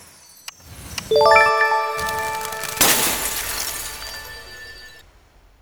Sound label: Recruit music